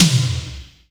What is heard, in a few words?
Drum